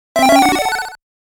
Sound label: Coin Sound; Namco Arcade Sound Digital Collection Vol. 1